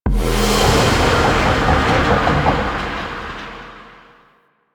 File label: Void Dragon Ring Correct; Enemies; Warframe; Duviri